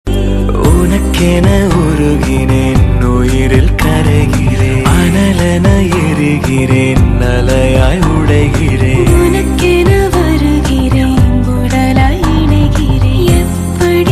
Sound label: love sad ringtone